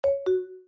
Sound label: snd approve; factoryballsforever